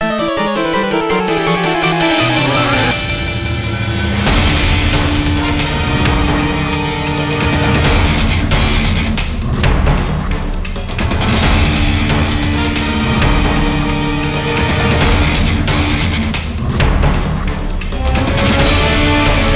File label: battle boss; pokerogue; rocket boss